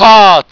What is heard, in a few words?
big gun